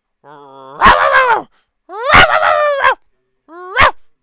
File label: barking